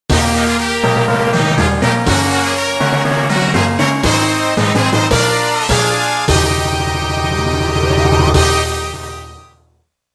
サクセスクリアジングル; power pro kun pocket 12; 表サクセス

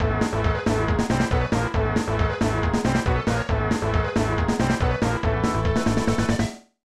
Rannyu no Jingle, snes; Ranma 1/2 Ougi Jaanken

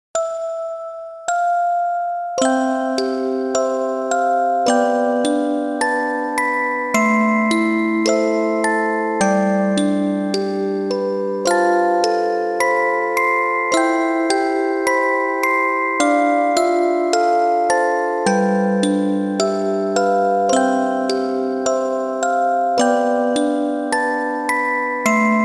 オルゴール; Koiiro Soramoyou

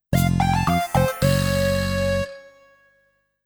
ジングル -「失敗」; tokimeki memorial drama series vol.2 irodori no love song

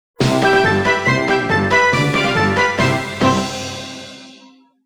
オールクリア ゲームオーバー; All Target Cluster

